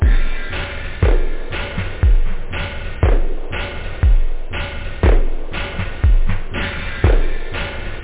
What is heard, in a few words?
core, loop techno